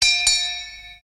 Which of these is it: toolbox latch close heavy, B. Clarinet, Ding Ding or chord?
Ding Ding